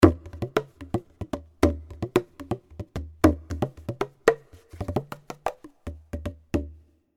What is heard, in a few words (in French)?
son Tambour Thailande; Percu; Duperrex